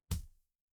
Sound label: impact punch